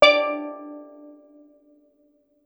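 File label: STEEL DRUM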